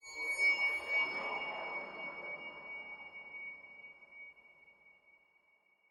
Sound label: scifi efx